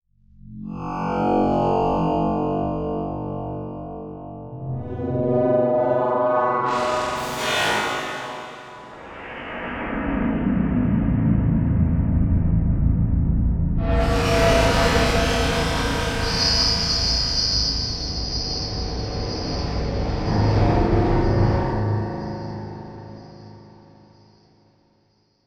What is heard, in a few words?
Electronic Whoosh Digital Spooky Delays Low Freque; Low Frequency; Production Element Title Imaging